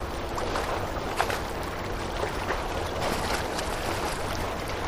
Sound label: Harbor Background Water Lap, Light Gull Distant